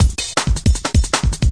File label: 158 flatheads, drum loop; 141 to 160 bpm